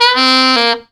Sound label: shiver sax; Zero-G; Funky Elements